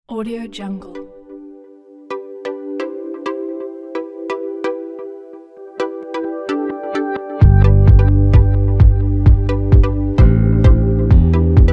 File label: corporate